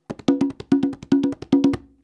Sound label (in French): roulement, congas